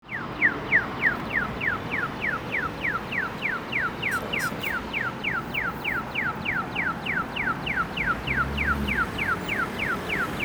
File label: madrid walk